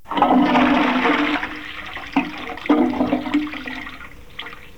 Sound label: flush